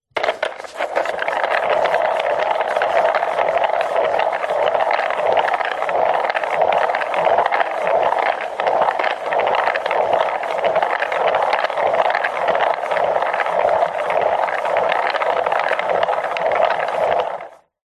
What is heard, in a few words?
industrial cart dolly small wooden wheels spin roll floor